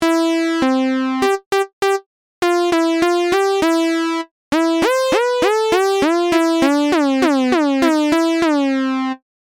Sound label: Ctrl Glide